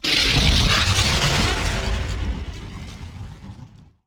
Teleporter Nebula Cloud End; Evolve; Wraith

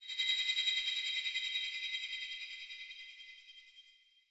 Explosion; Evolve; Gorgon; Lurker; Mimic

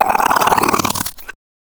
Pain Light; Evolve; Kraken; Grounder3

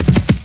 step; Necromancy

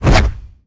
Whoosh Arm; Evolve; Behemoth

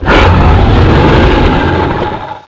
rawr; Necromancy

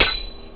dagger metal

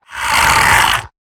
Web Sling; Lurker; Evolve; Gorgon

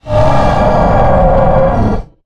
Harpooned; Evolve; Goliath

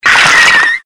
skeleton; Skeletal Ravager

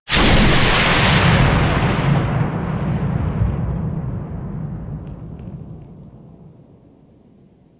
Lightning Strike Impact; Evolve; Kraken

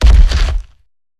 Climb Base; Evolve; Behemoth

